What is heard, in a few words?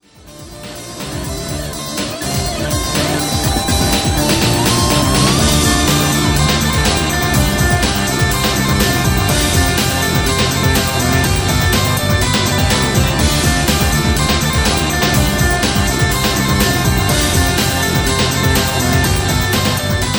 poprock